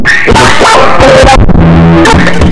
SCREAM LOOP; Big Beat Samples & More; AKAI; ALL LOOP KIT

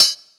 Hat, Hi Hats; Zero-G Sound Sense Grime Scene; GS Kits

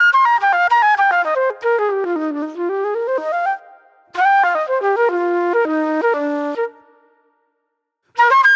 Raw Funk Flute; Sonic Foundry (Sony Creative Software); Manhattan Flutes

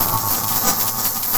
FX, Futurist Drum & Bass

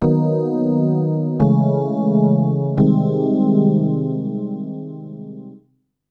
D&B Keys Riff; neuro

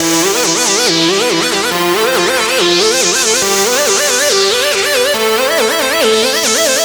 NRG Lead, trance

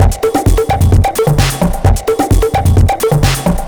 Beat Drive 130, distorted drum loops 130bpm; musicradar

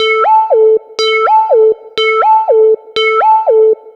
Analog Synth Pattern

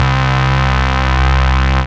BAS, Eurotech; Sounds Good

